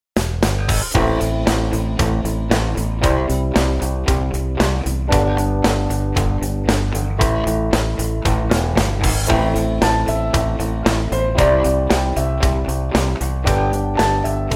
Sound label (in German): Musikstile Rock Pattern Ausschnitt